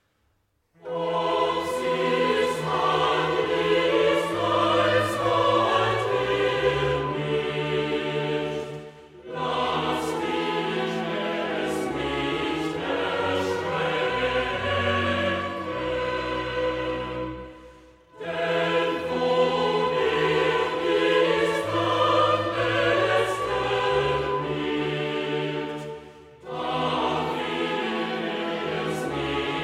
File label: choral; es ist das heil uns kommen her; bwv 9; ob sichs anliess als wollt er nicht